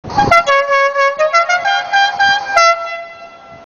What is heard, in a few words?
Dixie Air Horn; Dukes of Hazzard General Lee